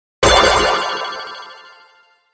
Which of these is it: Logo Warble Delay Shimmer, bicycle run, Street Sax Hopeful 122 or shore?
Logo Warble Delay Shimmer